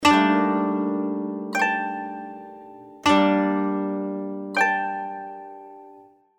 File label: koto